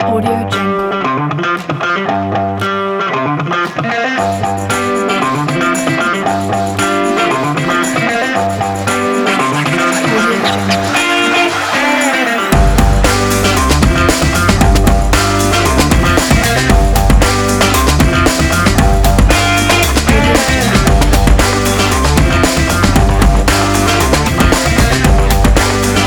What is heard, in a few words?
fat boy breakbeat